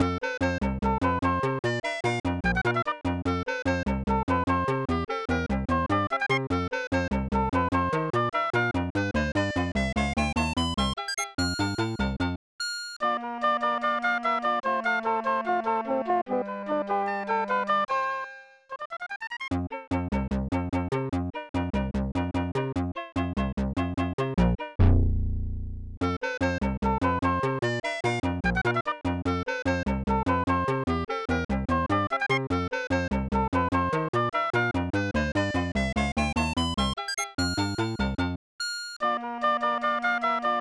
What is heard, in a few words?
Ice Cave; Mario and Wario